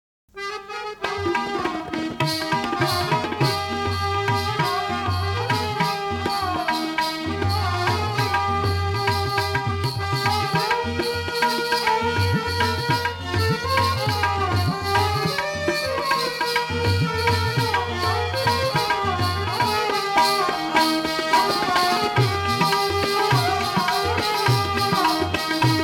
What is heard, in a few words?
instrumental; film mera naam joker